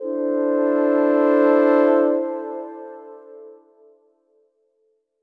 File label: orbit horns; Sky Children of the Light gamerip